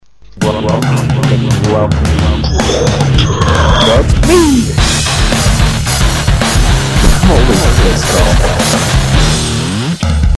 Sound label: Grumpy jingle